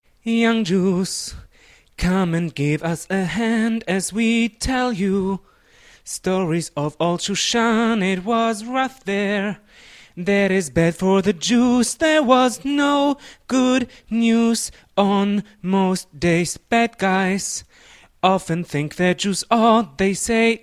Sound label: soprano; choir